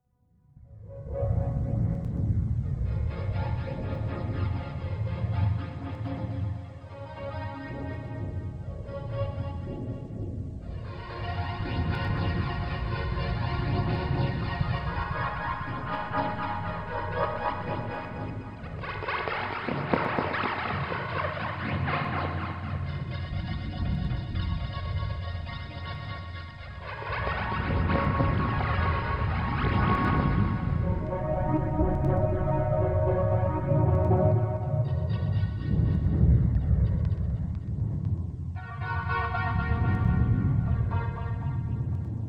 Db Wholetone 60, Deconstruction

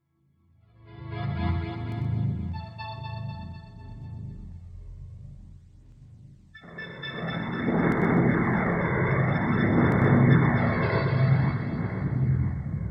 A Mixolydian 60, Deconstruction

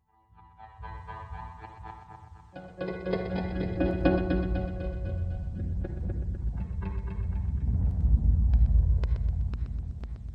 Bb Locrian 60, Deconstruction

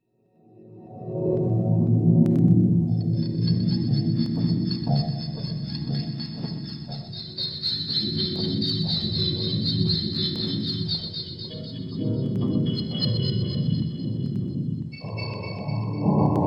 Eb Mixolydian 60, Deconstruction

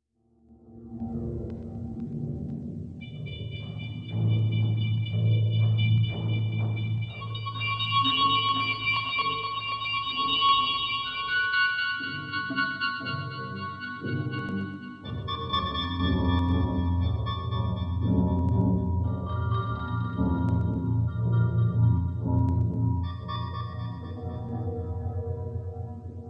Ab Chromatic 60, Deconstruction

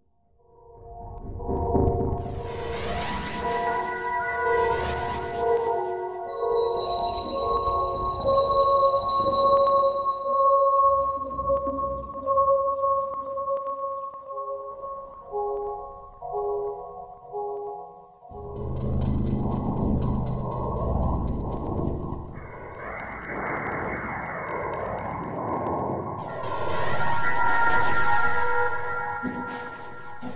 Db Diminished 60, Deconstruction

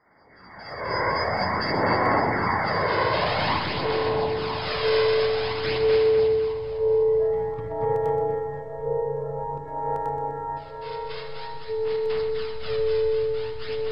D Lydian 60, Deconstruction

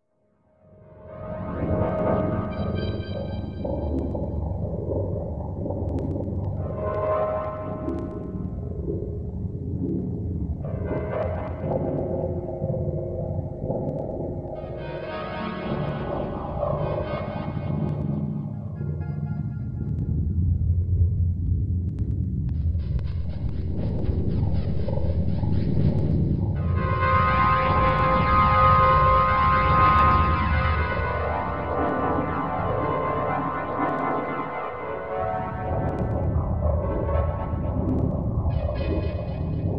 E Ionian 60, Deconstruction

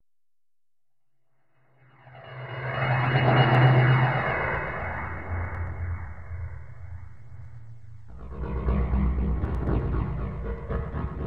B Melodic Minor 60, Deconstruction

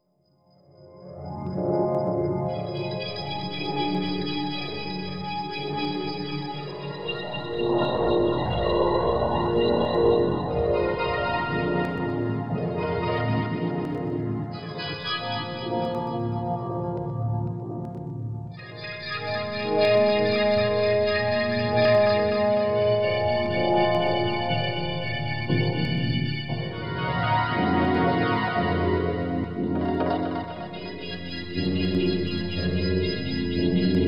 F Augmented 60, Deconstruction

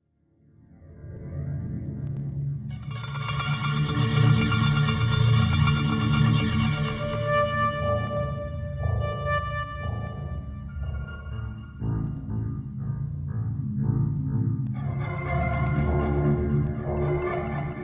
E Diminished 60, Deconstruction

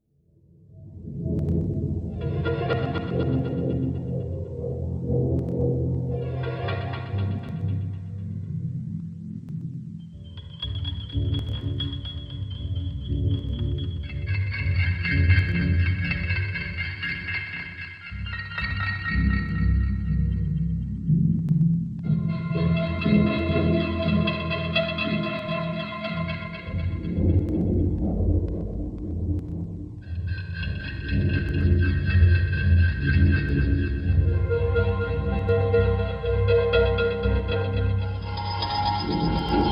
B Aeolian 60, Deconstruction

